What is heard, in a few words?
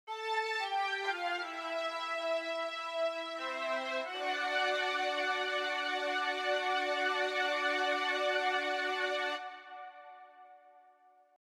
orchestra processed